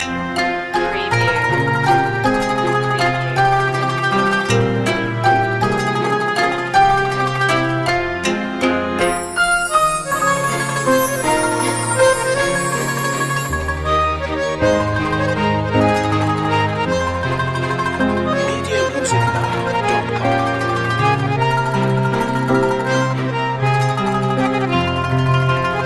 world valse loop

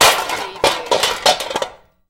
Metal Debris Impact Settle

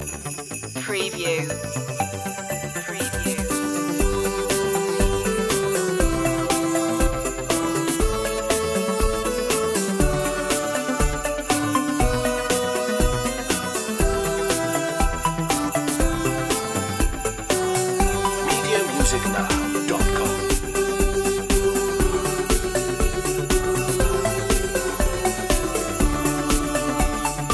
merry christmas synthified

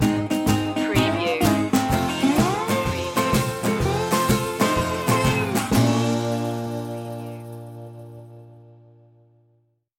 tom foolery stinger

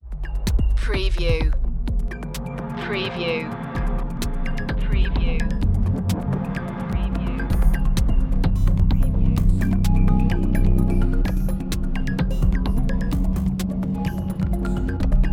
virus underscore

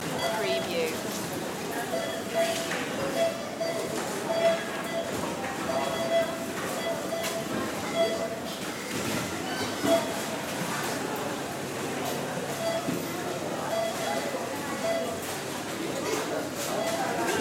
superstore ambience loop

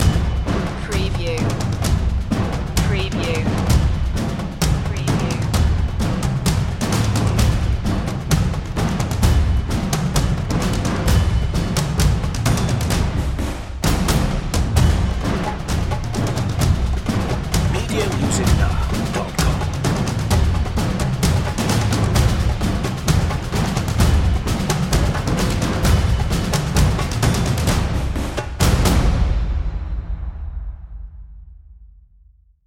storming percussion

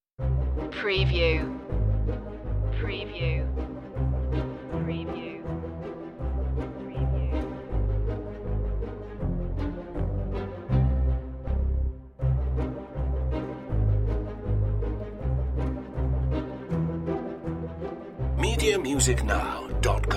spooky adventure no theremin

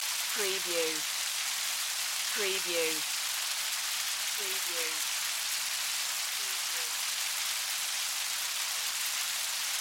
Nature Weather Rain Loop

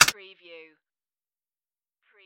keyboard

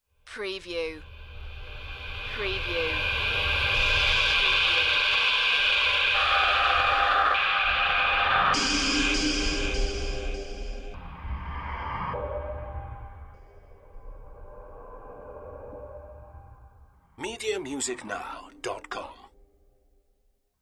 space breath

